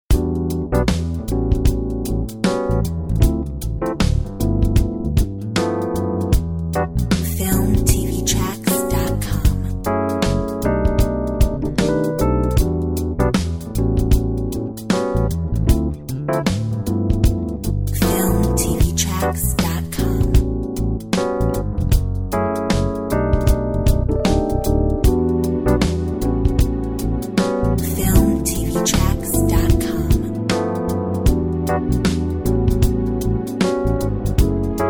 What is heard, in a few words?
Jazzy lounge